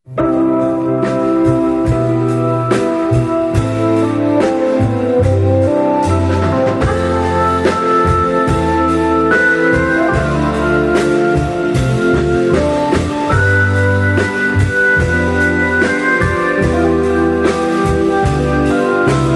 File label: Hammond; keys; elektro